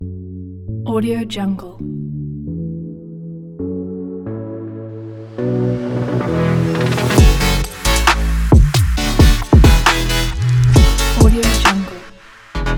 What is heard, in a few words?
digital youth, هیپ هاپ; موسیقی بی کلام